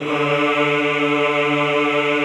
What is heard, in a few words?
EHH D2, Classical Choir; Best Service